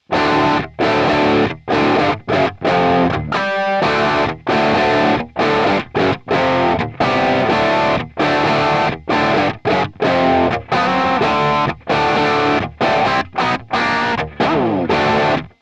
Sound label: double track